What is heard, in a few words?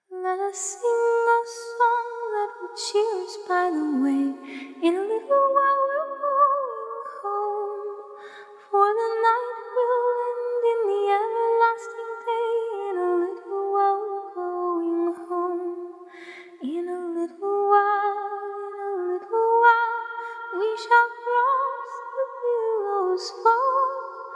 acapella hymns